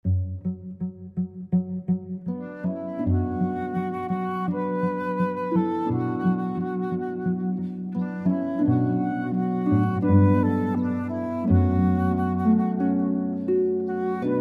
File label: harp flute cello; Old Irish Blessing